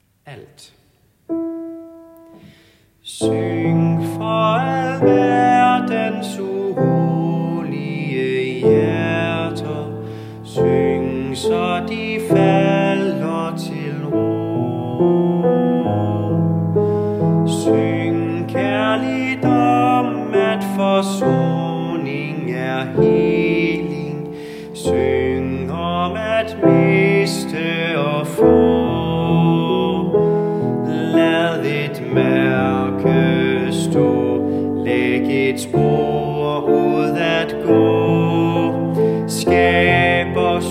Syng for alverdens alt; laudate kirkens folkekor

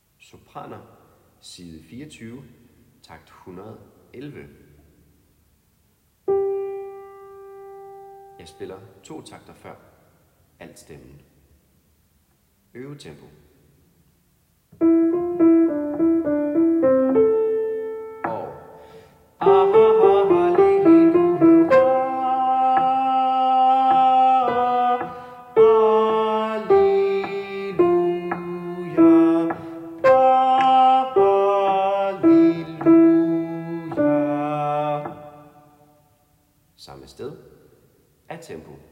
Alleluia synkober; laudate kirkens folkekor

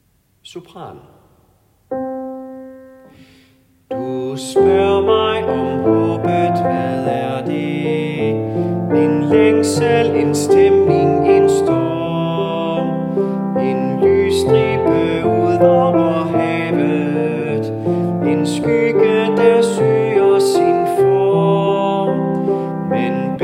Du spør sopran; laudate kirkens folkekor

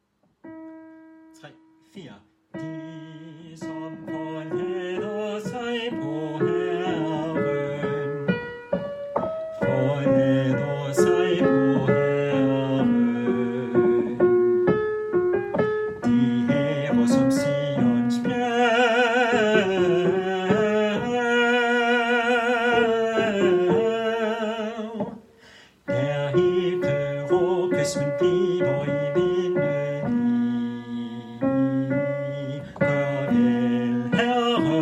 De som forlader sig på Herren; laudate kirkens folkekor

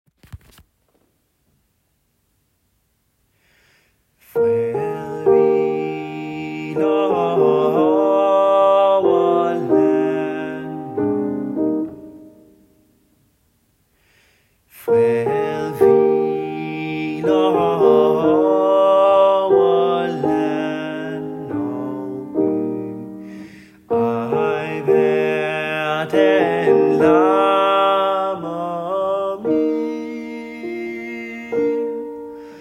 Amazing Graze tutti 2. Vers; laudate kirkens folkekor

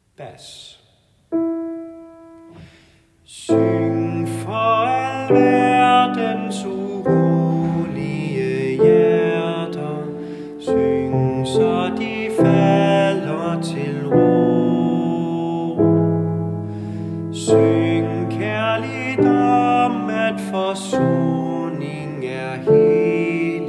bas, kirkens folkekor; laudate

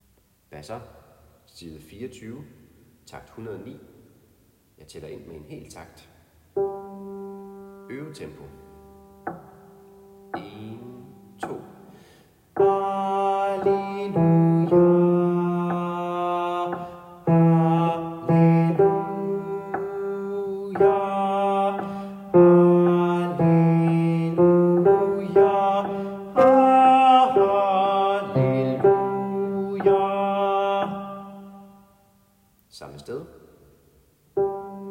Alleluia synkober; laudate kirkens folkekor

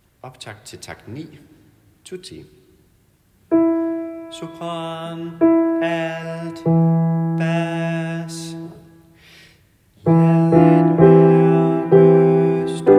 Syng for alverdens tutti; laudate kirkens folkekor

